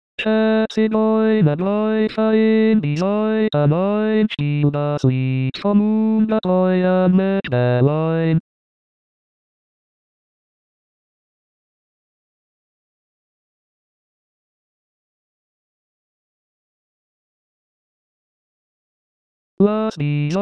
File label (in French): basse